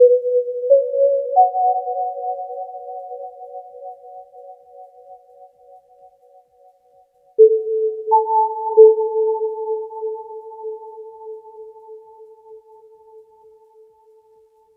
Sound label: Ambient Lead, Big House; Sonic Foundry (Sony Creative Software); Afterhours EDM